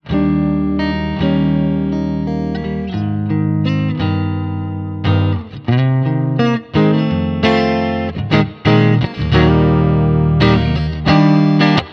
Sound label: Gain at 9 O'Clock